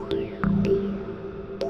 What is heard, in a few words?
TECHNO SYNTH 140; Keys